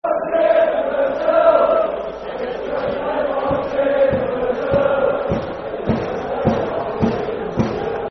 Watching Brazil, Football Chant (Soccer Song), N. Ireland